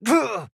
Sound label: AHurt; Fern